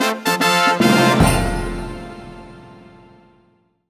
Jingle Reward; Kirby's Dream Buffet

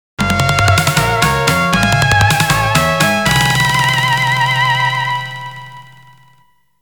Game Over (Game Over); konami arcade shooting cd box

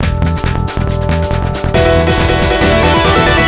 Outbreak (Round Start)

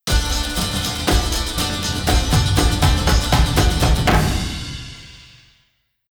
Combat Out; Skellige

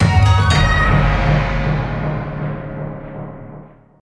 Music Shitake Win Fanfare

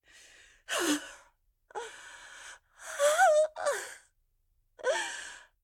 Agony Pain Standing Still; Zara; Devour 2021 gamerip pc macos

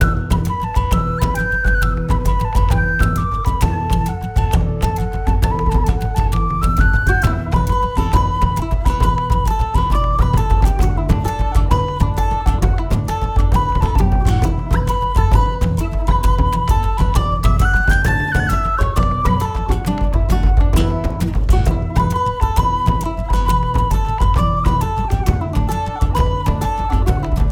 Pirate cove loop; Gnarled Forest; lego universe macos windows gamerip 2010

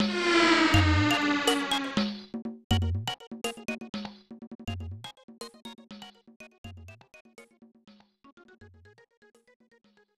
Goal; Pikiinya; SNES

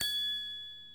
Chime; Idle Champions of the Forgotten Realms

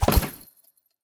Lift Start

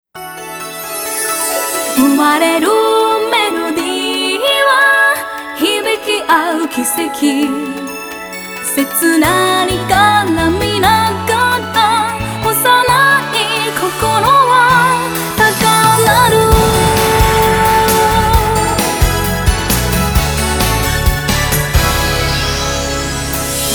haunting melody; Tears to Tiara Earth's Wreath

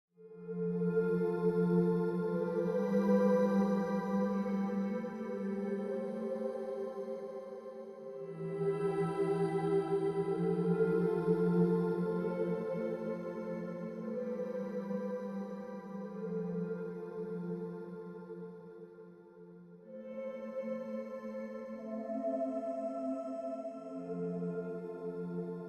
The essence of being [Ambience]; Next Day Survival

